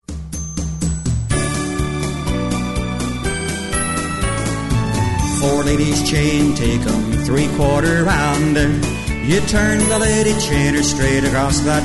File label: vocal, square dance